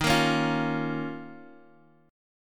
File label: Eb 7th Suspended 2nd